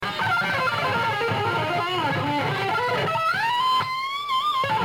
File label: metal guitar lick